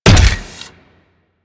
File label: Step; Warframe; Corpus; Arachnoid Wraith; Steps